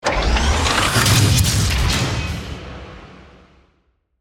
Mechanical Power, Up, Morph, Fast, Transforming, Robot; Imaging Element; Accent; Transition; Spacecraft; Production Element; BlastWave